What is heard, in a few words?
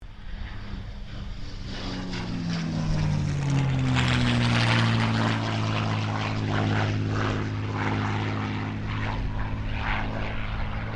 AIRCRAFT CA-18 MUSTANG PASS; P51 /CAC-18 Mustang; Airplanes; Vehicles